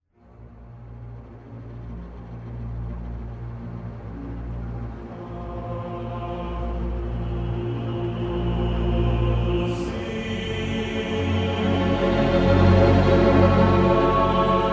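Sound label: music Intro; Drustvar GolKoval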